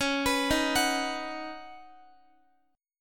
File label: Db 7th Suspended 2nd Suspended 4th, Guitar; Db7sus2sus4; arpeggiated; tuning=4F,4A,5C,5G,5C,6E